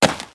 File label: Dirt Run